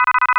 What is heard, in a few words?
Long Connect; Radio Software; JPS; ACU1K; ACU Controller